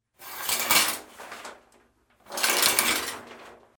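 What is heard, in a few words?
sound of curtain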